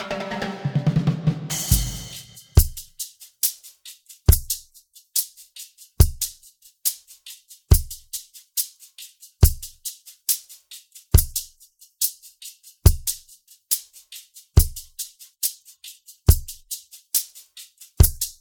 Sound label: music home drum